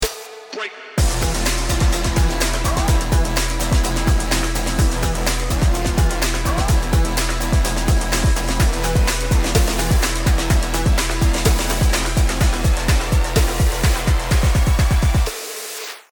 start action, music

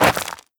scuff, footsteps, player, dirt

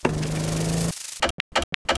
headcrab canister ambient; Half Life Model Pack; Half Life 2; ambient levels canals

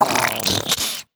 spawn oildrum fall; headcrab classic; vox

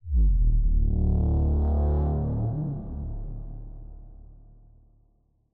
cita d2, music, ambient, cita bass; Half Life Model Pack

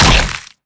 bite, headcrab

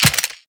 weapon c4 impact; physics; weapons

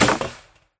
headcrab cage impact; scripted sequences; hideout

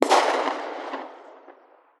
hkp2000 distant, weapons; Counter Strike Model Pack; CS2

